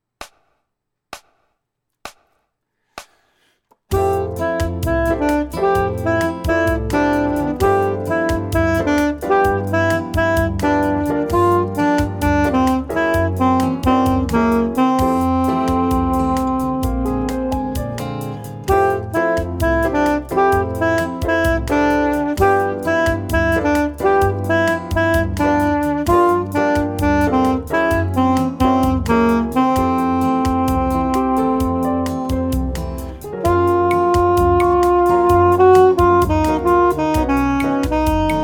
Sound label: sax